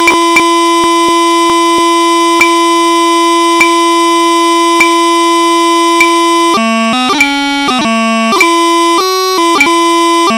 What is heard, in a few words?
Donald MacLeans Farewell to Oban - 50 bpm- bip bip, piping; highlanders